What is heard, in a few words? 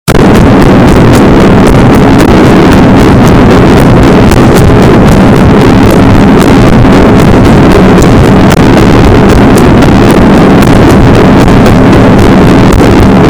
really realy loud african music